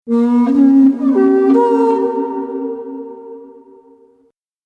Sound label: Play Snow Horn Flute; starfox